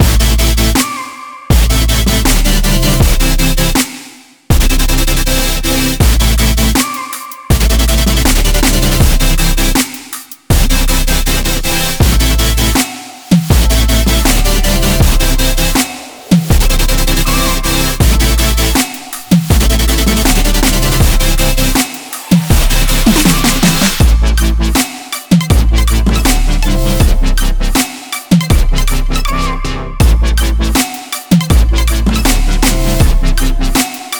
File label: uplifting future bass